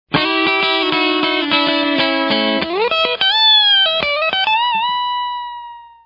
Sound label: compressor, effects